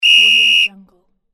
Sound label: referee whistle